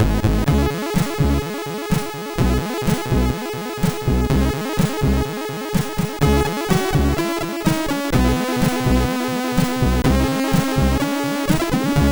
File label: sid, MOS6581; Tape Me; MOS6581R4